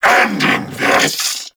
Vocal Attack; Warframe; Duviri; Dax

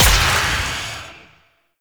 Shot Medium; Weapons; Warframe; Duviri; Incarnon Adapters

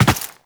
Land Default, Footsteps; Warframe; Tenno

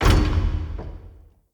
Vent Close; Encounters; Ode Pollution Tower

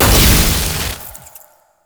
loot crate energy; Warframe; Entrati; Loot Crates

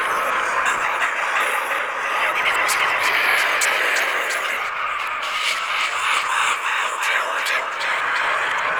Sealab Stalker Whisper; Warframe; The New War

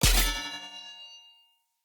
Short Sword Medium Parry Perfect Block Impact; Weapon Impacts; Player; Swords; Combat

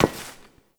Step Metal; Warframe; Koumei

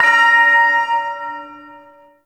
Shawzin Oct Two A Sharp; Music; Warframe; Duviri; Ersatz Shawzin

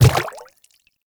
Quadruped Medium Walk Water, Footsteps

